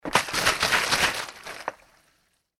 bottle plastic shake water, Bottle (Plastic) Shake With Water Inside; Plastic Bottle Being Shaken With Water Inside; Materials; foley; McKinney Sound